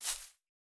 杜鹃踩踏声

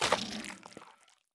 幽匿破坏声

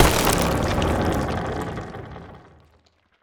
嘎枝死亡声

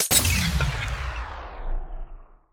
重生锚耗尽声

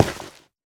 菌岩 踩踏声

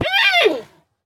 熊猫死亡声

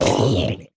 僵尸村民死亡声

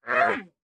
熊猫无法繁殖声

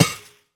刷怪笼破坏声

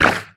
鱿鱼受伤声

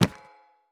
錾制书架取出附魔书声

